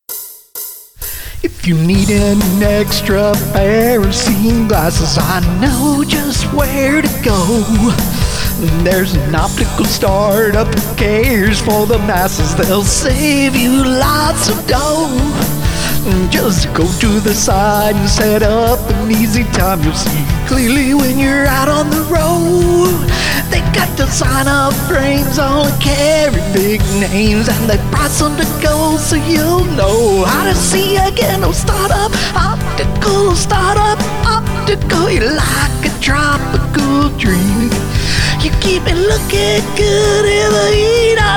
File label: music male; startup optical